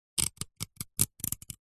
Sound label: household comb metal teeth scrape with sharp metal